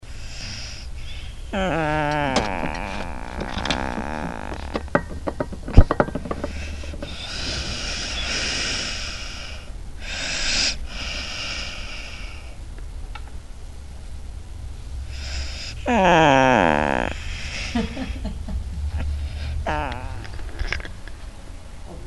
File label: sleep